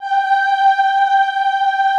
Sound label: AHH G4, Classical Choir; Best Service; Extended Classical Choir; AHH MIX